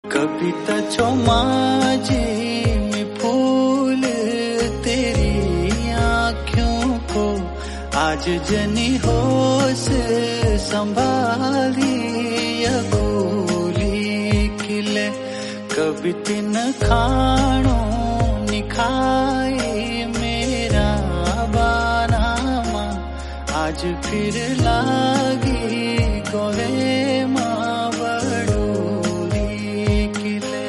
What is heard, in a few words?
Garhwali Song Ringtone